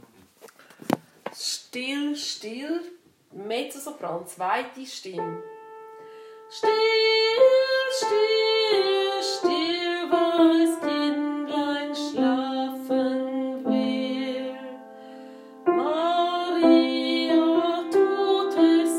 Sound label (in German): Still, still 2. Stimme Mezzo